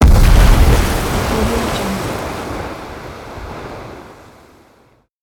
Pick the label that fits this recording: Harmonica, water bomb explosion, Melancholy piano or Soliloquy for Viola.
water bomb explosion